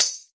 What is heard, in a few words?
classic hip hop; STYLE KITS (Hip Hop, Etc); Classic Hip Hop Mega Kit